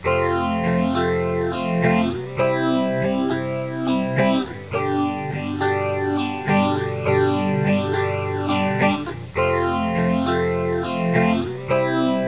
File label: phase 100